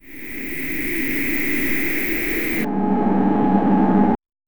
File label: a magical world cartoon, background ambience